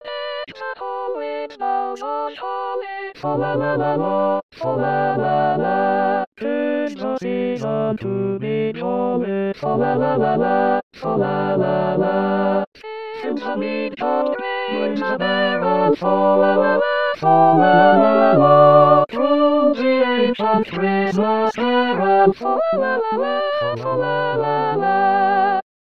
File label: Deck the hall All